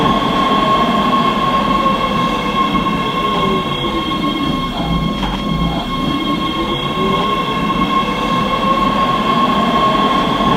Motor, train; OpenBVE

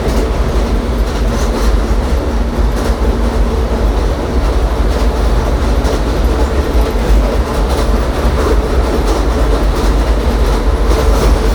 RUN, Train, R62 Kawasaki; OpenBVE

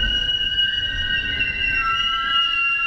rub, Train; R110B; OpenBVE

